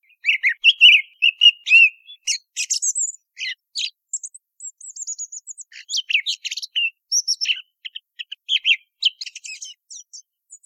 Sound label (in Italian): Tordo bottaccio Spagna, birdsound